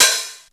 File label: 909 HHOPN